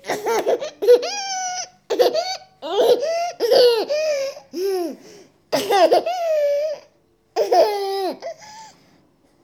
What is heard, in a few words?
baby girl laughter